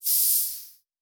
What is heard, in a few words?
Air Hiss, Sci-Fi Sounds